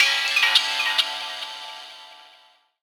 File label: RAW JAZZ FX, Gbm; Rawcutz - Nu Forms